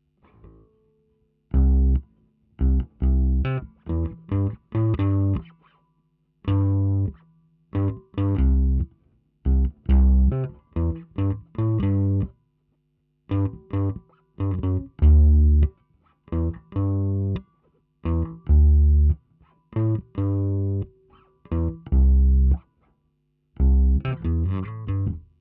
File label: bass; logic